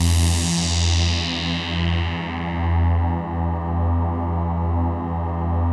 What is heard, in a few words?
A PAD, ATMO PADS; Best Service; XX Large